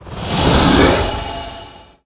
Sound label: Divine Rager Attack